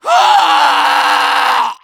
medic pain crticial death; tf2

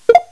hit; mario

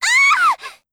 "eiiaaaahhh!"

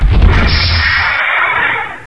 mantaship undock

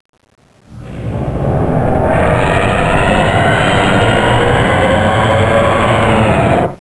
lava scream

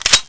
pistol slide forward

